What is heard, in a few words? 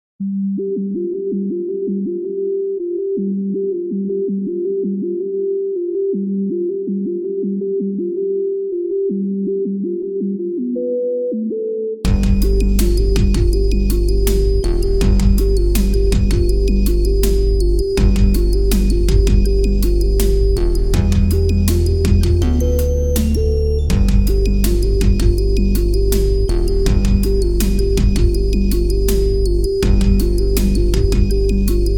Softsynths mix; Savant Audio QRS; Quantec QRS